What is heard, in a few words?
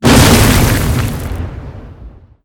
impact ground mid